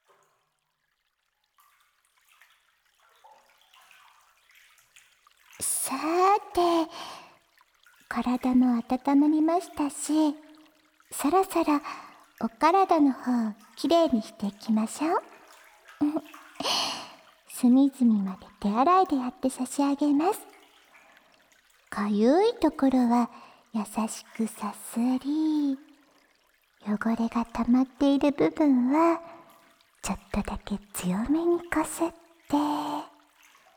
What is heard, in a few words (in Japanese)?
女の子の吐息、そんなに好きなんだ（洗髪＆洗体）; 耳かきリフレ『春乃撫子』; ロリ店長; 温泉旅行